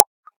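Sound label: Pen detach notification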